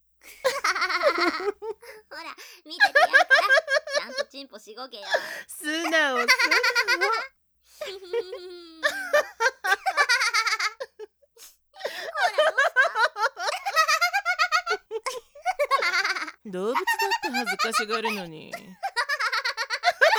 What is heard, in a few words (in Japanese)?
嘲笑; 嘲笑御褒美; 射精するまで無限リピート